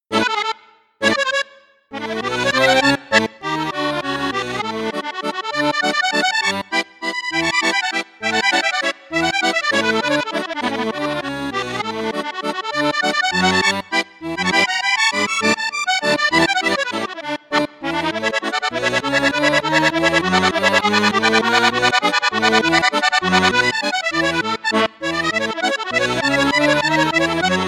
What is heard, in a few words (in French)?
registre flute basse flute juste flute haute, crucianelli accordeon